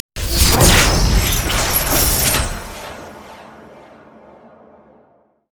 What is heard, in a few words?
alter lock; LoL; altars